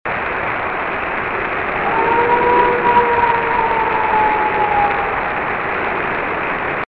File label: Florida howl